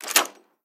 unlock, lockpicking

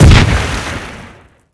tank shell; wac

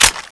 clip out; tfa cso; k3

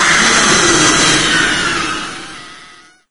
death, lostsoul; doom3

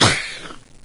maggot melee; doom3

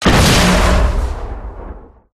rocket launch stereo; gow; silverback

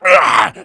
zombie pain

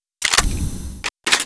plasma reload

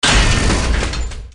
fortifications generic repair; gow

